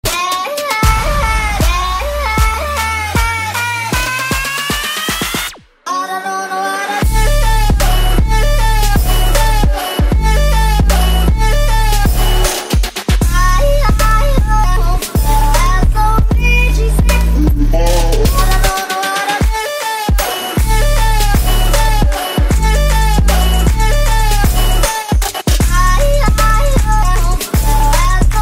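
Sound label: Yodeling Kid Remix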